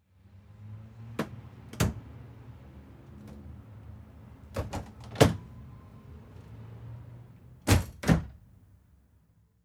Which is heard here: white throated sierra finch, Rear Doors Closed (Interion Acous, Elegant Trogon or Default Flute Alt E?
Rear Doors Closed (Interion Acous